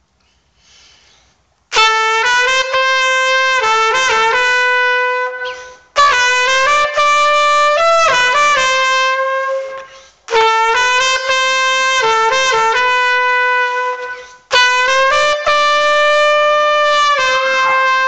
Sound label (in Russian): Труба